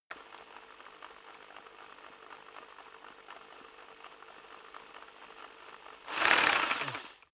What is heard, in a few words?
rock drill hammer idle rev; maschinen; bohrer; soundpool